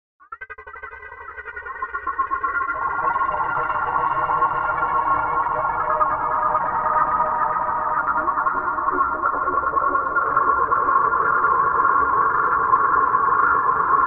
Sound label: Deep Space FX; Cosmology